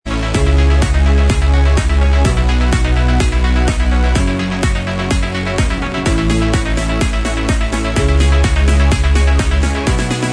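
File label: remix instrumental Track, backing tracks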